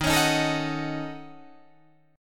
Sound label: Eb Minor 7th Flat 5th Eb down Guitar Drop D